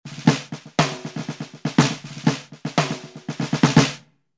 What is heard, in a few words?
marching snare